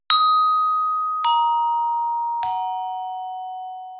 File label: Glockenspiel Glockenspiel, Deurbellen; 500 serie